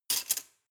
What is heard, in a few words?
cutlery large drawer place